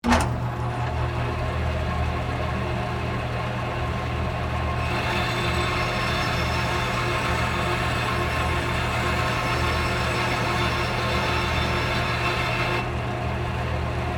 Band Saw Cut 1x4, Industry, Soft, Wood, On, Run, Off; Motor